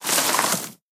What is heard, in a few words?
Teaparty Hedge Collision